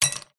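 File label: Ice Cube Throw One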